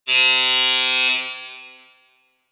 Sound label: buzzer